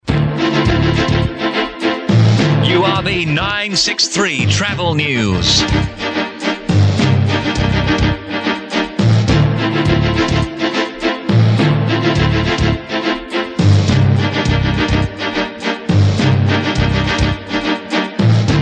travel news, strings bed

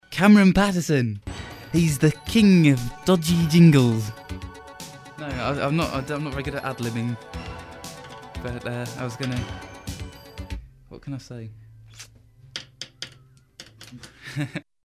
dodgy jingles; no good ad-libbing; bailrigg

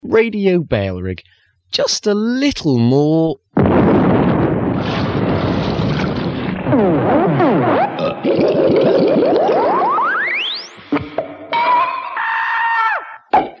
just a little more loads of sound effects, jingle; radio bailrigg

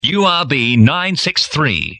jingle, no bed

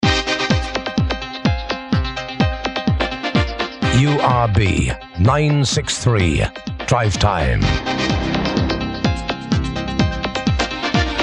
jingle, ohmpah bed; drivetime

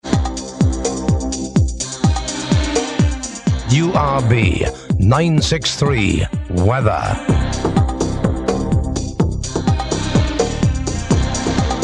weather, thumping bed

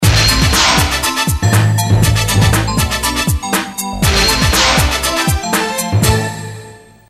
kids jingle; bbc; bailrigg fm; bfm jingles ads RSL 1994

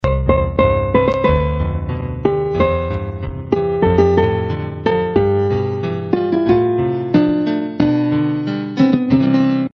harpischord; intro

